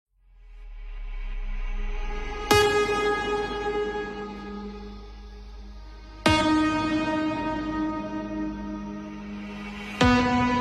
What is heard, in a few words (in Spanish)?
Intro Electrónica corta